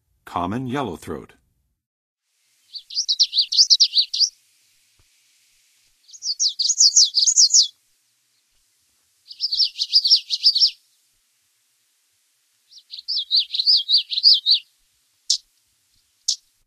Common Yellowthroat, Songs of Birds of North America; Western USA; Western USA Songbirds